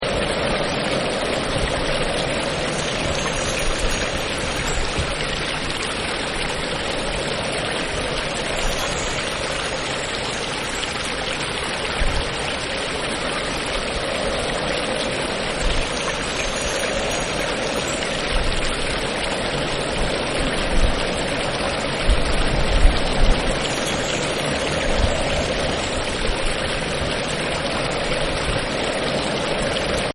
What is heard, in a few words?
Brook Wind; Rivers; Partners In Rhyme